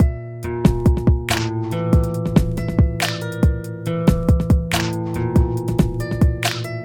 Kung Fu RnB 70bpm

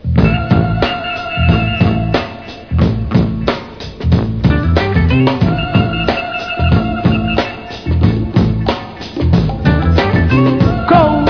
Hard Times Funk

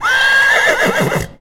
Horse Neigh Sound

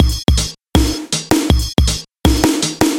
DanceHall Ragga

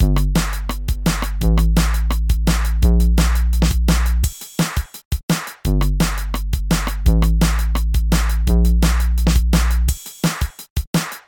Jump Up Jungle

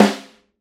Piccolo Snare

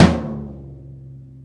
Ludwig Mid Tom

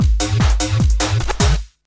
Happy Hardcore Loop

